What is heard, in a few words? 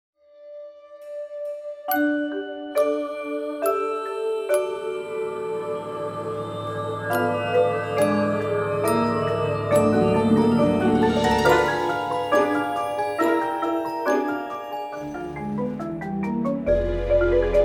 music box